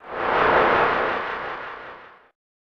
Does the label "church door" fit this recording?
No